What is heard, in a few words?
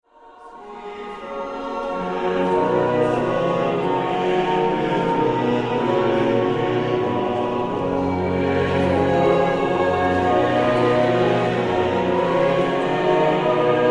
sax